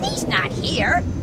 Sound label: grunt; h3dialogue; staten